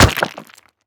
stone impact small

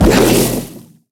blood laser weak

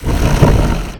stone walker

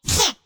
littlehorn grunt

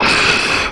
monster yell

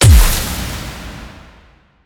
flashback

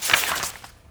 paper in

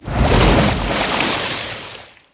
beast lava surface

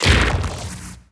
bounce; carrionqueen

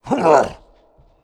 punch; bumbino

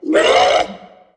growl

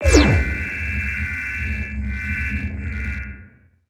telekenesis

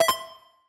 coin dime; horizontalrunner

